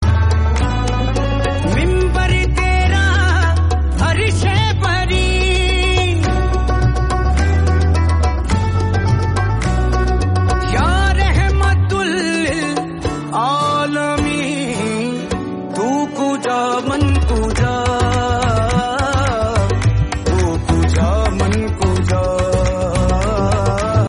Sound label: naat ringtone